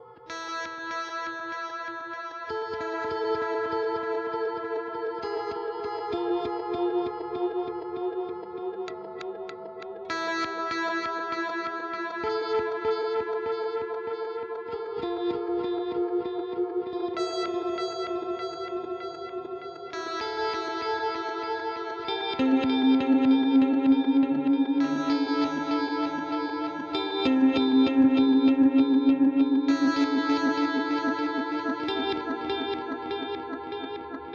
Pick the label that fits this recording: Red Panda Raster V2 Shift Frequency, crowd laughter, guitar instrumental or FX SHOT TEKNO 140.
Red Panda Raster V2 Shift Frequency